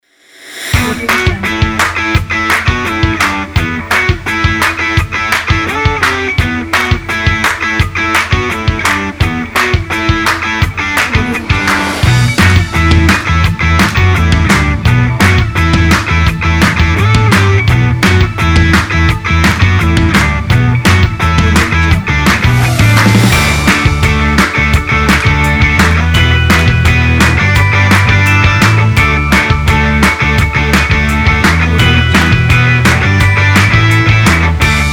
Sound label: advertizing indie music; soundbar